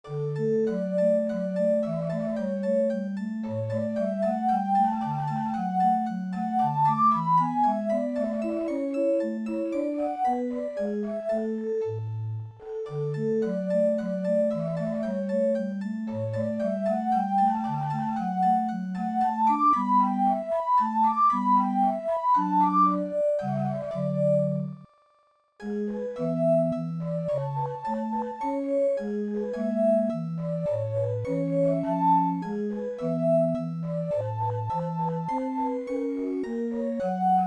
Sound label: Baroque Recital; lego star wars ii the original trilogy 2006 gba